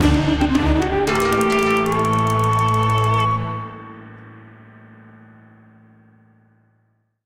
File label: Great Eats of Giza (Transition); Cooking Dash